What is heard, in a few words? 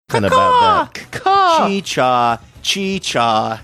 making raven sounds